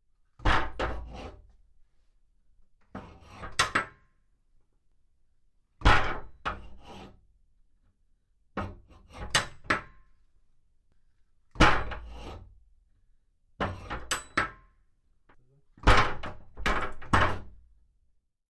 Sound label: Shelter Metal Door Latc; latch, metal